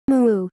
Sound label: Moo